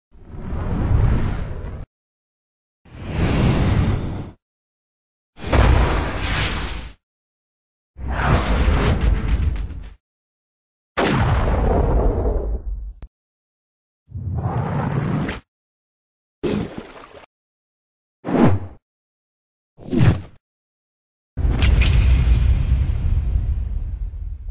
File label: Cinematic Sound Effects